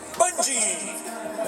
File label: Bungee; Kermis Jingles Diversen